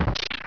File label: pot destroy; Open Zelda 6.2.4; Quest Designer 2.0.4